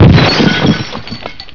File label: smash; Kinga 2.0